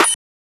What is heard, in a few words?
Snare, trap drum kit; MZ BEATS - FREE TRAP DRUM KIT